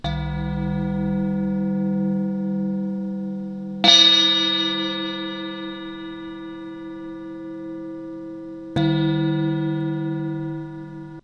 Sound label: bell, metallic stuff, bowls